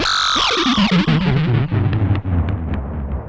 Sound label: GameOver